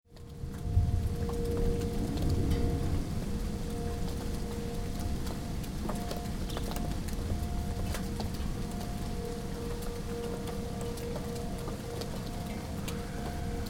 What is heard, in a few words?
tornado sirens wisconsin; june 6 2008 west allis